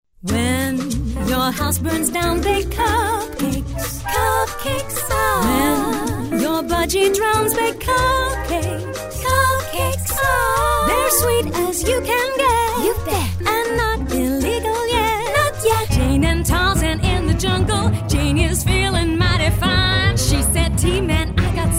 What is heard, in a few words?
singing; EM Voices